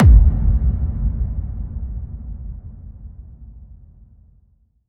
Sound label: FX Kicks; Effects; Vengeance; Essential Clubsounds Vol.4